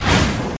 dash

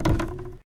sling shake

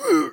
Scream

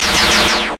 antena beam

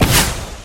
missile fire

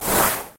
skill2 throw car

